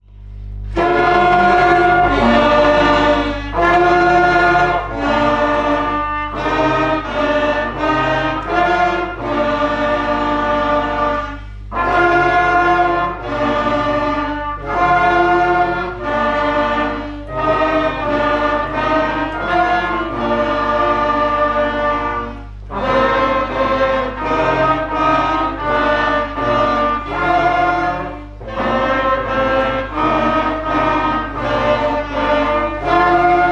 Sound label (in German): Blaeser Stille Stille kein Geraeusch